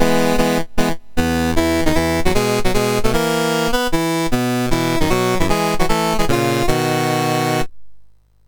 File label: sid MOS6581R3; Games Summer Edition menus